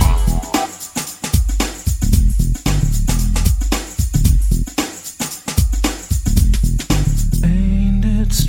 113 bpm nineties breakbeat sample g key, Free drum groove - kick tuned to the G note.; drum loop